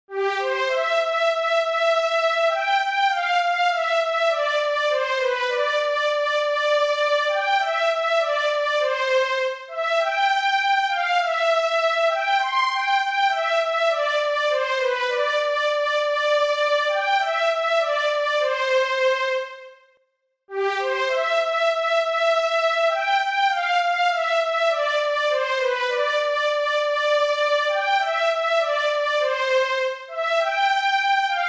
Ein Jäger aus Kurpfalz C-Dur 100bpm, Volkslieder

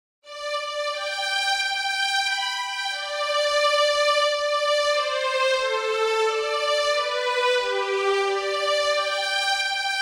Die Gedanken sind frei G-Dur 90bpm, Volkslieder

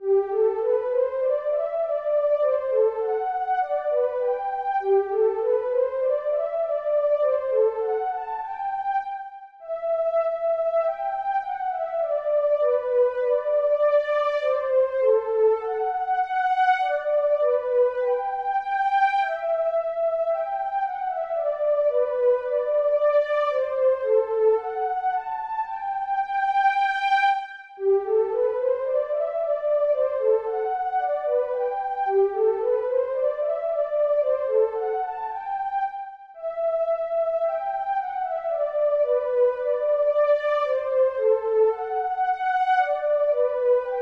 G-Dur 112bpm, Volkslieder; Horch, was kommt von draußen rein

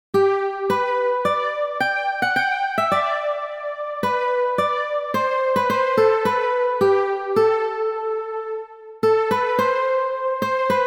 Wem Gott will rechte Gunst erweisen G-Dur 108bpm, Volkslieder

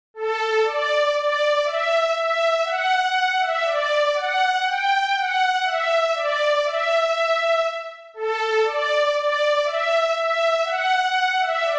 D-Dur 120bpm, Volkslieder; Wenn alle Brünnlein fließen

